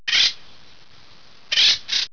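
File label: woodpecker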